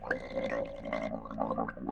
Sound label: lava flan; mobs